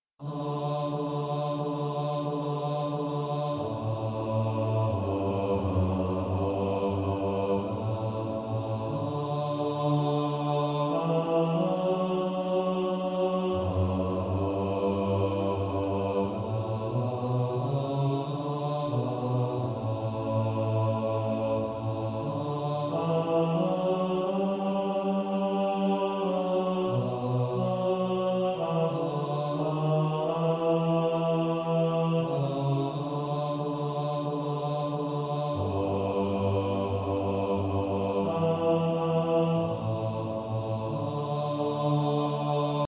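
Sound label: bass, practice music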